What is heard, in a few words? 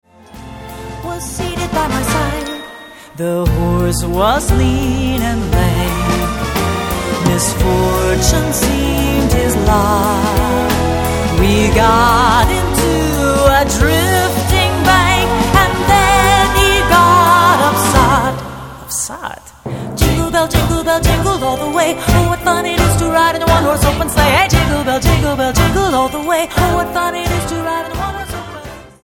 jingle bells